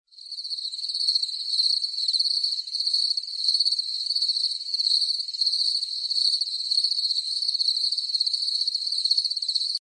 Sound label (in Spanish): Canto de grillos; EFECTO DE SONIDO DE AMBIENTE; GRILLOSS CANTO DE GRILLOS